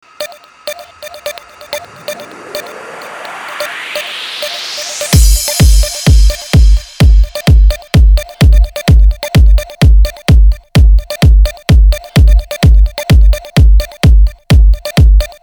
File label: bassy